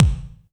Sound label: HOUSE DRUM BD